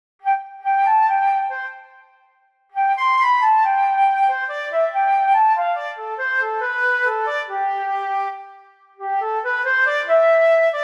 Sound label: flute improvisation